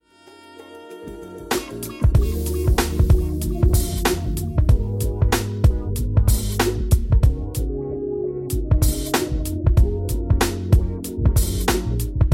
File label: Jazz